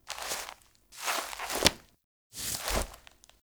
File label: Foley Worm Queen Movement; Warframe; The War Within; Queens Chamber Scene Eleven; Cinematics